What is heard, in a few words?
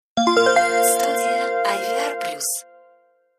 bell